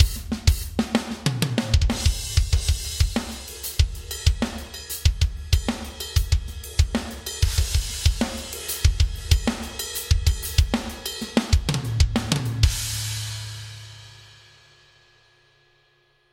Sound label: Analog